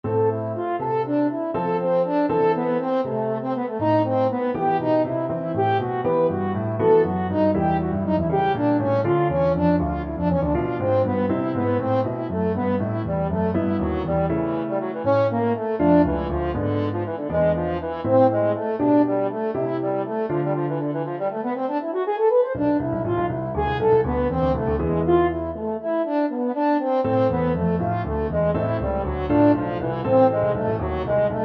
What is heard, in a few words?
french horn